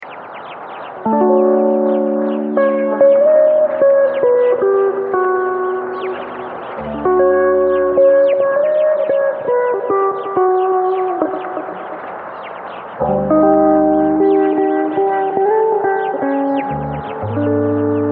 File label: instrumental